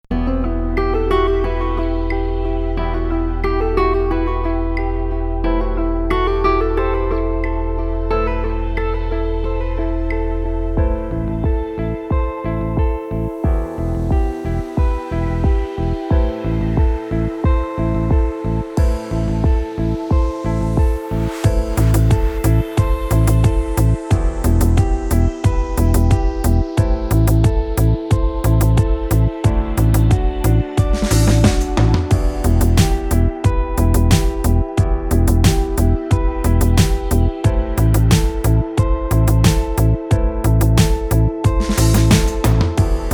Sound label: Pop